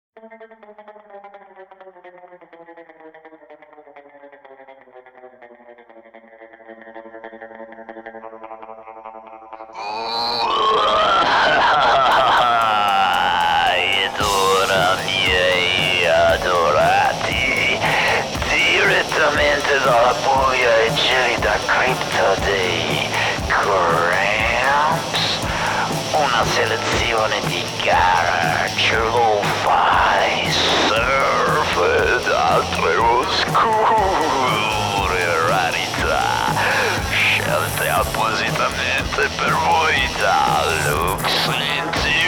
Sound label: Jingle